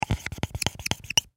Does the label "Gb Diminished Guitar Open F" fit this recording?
No